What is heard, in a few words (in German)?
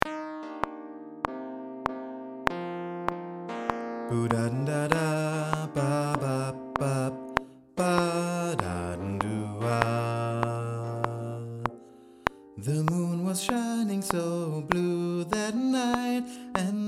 bass solo eingesungen